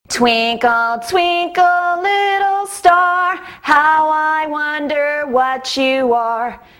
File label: Throat Singing